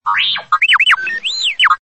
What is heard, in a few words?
R2D2 Message